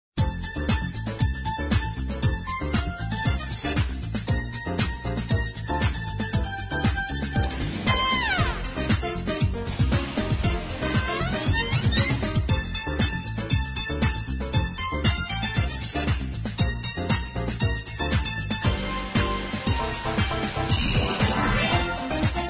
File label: music; kringle academy